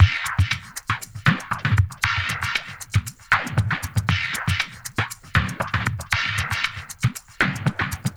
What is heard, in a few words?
SWEEP1 R; Spectrasonics; Liquid Grooves 1 & 2; 117 AQUAFUNK